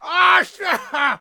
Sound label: Captain Attack Vocals